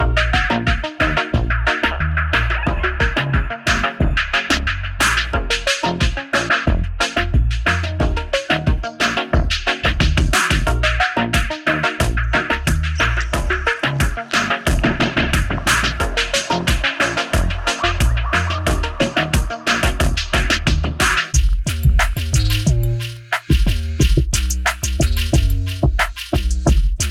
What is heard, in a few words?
bassline, rock sound